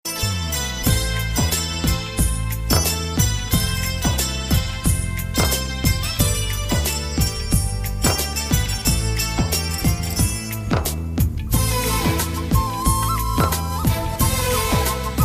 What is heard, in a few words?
apple penne ringtone